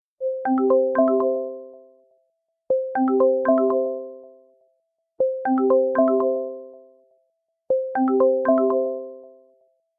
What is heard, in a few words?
timer, homepod